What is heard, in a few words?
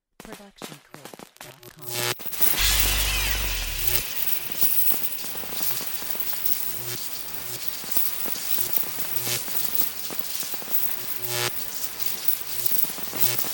electric long